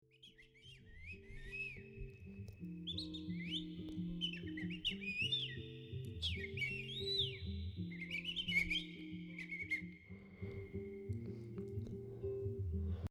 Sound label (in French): oiseau verioca